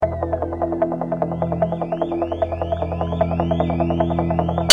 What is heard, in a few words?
trio loop